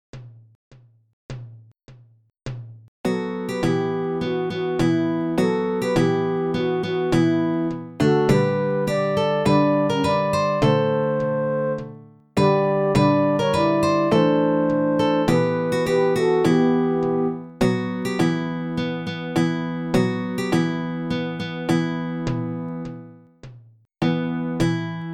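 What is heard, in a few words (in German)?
midi 3-stimmig; lied eines tamburs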